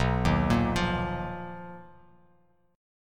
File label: B Major Sharp 11th B, Bass Standard; BM#11 arpeggiated; chord shape; JGuitar